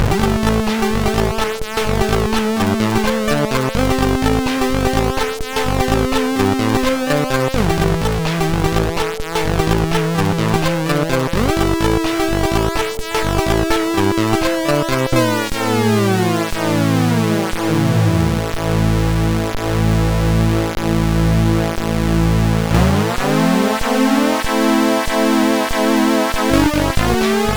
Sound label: MOS6581R3, sid; Jolp